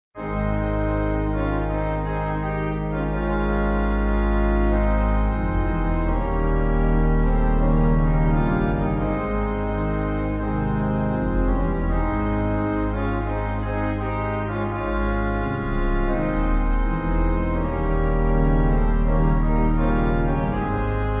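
Pipe, Free Music Backing Files for Churches - Piano, Band, Pipe Organ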